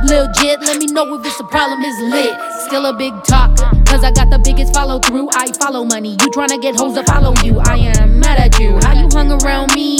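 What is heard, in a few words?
rap, English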